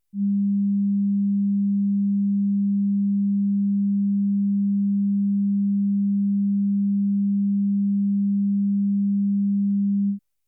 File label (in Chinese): 200Hz測試訊號; 雨果金碟